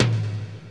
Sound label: tom, dance drums; Best Service; Dance Mega Drums; TOMS